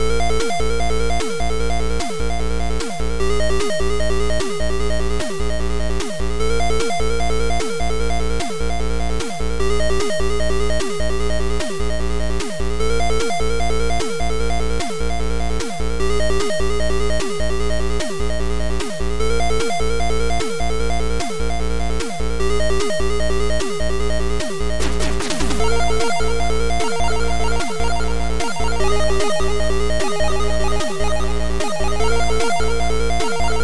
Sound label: Fanfare